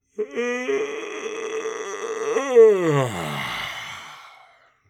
Old man exaggerated yawn

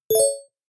Bright pop up

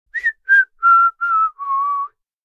Woman whistling an happy melody

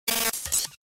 Error glitch

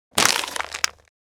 Plastic paper short rustle

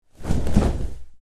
Dracula cloak movement vampire whoosh

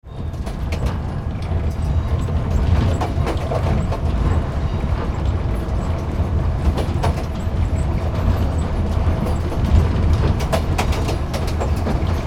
Passenger train inside perspective slow speed; gfxsounds

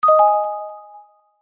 Notification bell delayed ding

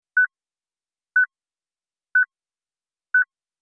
Car interior beep signal notification

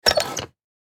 Office hole punch paper vintage

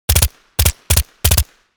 submachine gun two three round bursts; Engineer

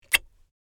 Elderly woman smooch; kiss

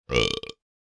Man deep belch

